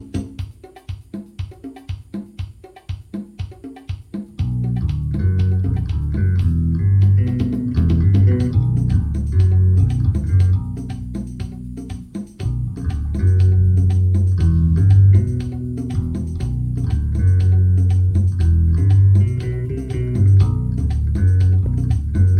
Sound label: Merengue